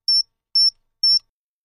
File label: Alarm Synthetic; hi-tech various